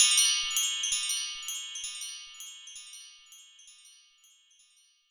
Roland JD 990 Windchimes

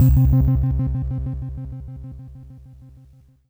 Yamaha PSS-280 Comet C2

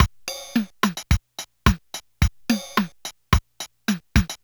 Casio MT 600 Beguine

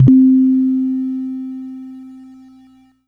Yamaha PSS-170 Raindrop C3

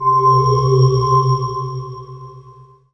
Korg M3R Solar Rings C2

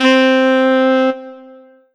Roland SC-88 Tenor Sax C4

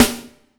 Korg TR Rack Standard Kit Snare Drum

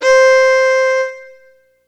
Ensoniq SQ-1 Violin C5

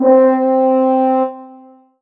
Roland SC-88 French Horn C4

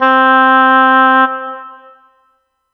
Roland JV-2080 Oboe mf C4

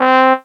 Roland U-110 Soft Trumpet C4

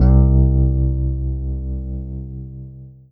Kawai K3 Bass C2

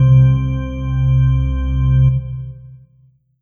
Alesis Sanctuary QCard Wave Organ C2

